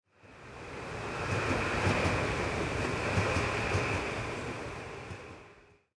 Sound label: Ambience Train Passing; Subway Surfers City; Android, iOS